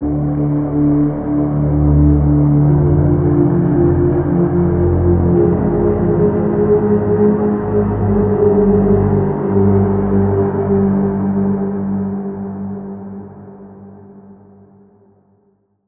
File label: Pad; Routed Sound; Spire Expansion - Quantum Enforcer Vol.1